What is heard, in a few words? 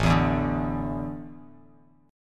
A Suspended 4th, Bass Standard; Asus4 strummed; JGuitar